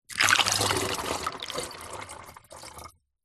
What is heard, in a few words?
household water pour into plastic funnel